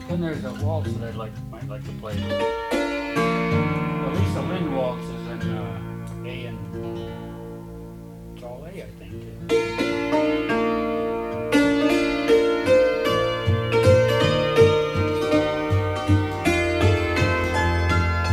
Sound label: Lisa Lynn waltz